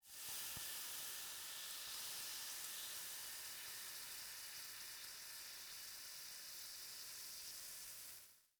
Liquid Water Sizzling On Pan

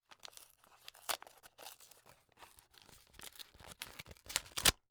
Household Paper Envelope Tearing Open

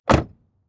Saturn Close Door Exterior; Auto; Transportation

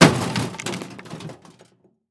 Impact Metal With Debris Hit Cement; Impacts

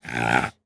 Animal Mammal Herbivore Boar Snort; animals; AdobeCC

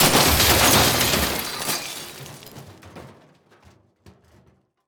Crash Big Debris Glass Metal Wood; Crashes

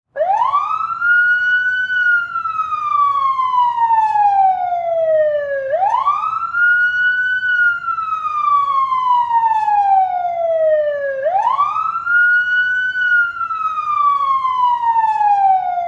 Siren Police Wail In City From Behind; Emergency Effects; AdobeCC

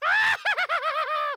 Horror Scary Human Male Laugh Witch Laugh

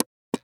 Technology Electronic RCA CD Player Buttons

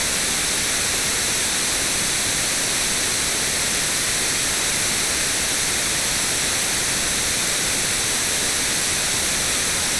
White Noise Spatial Stereo

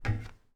FOOTSTEP - Metal Plate Walk Barefoot Male; Barefoot on Metal; Timothy McHugh; GDC Game Audio Bundle

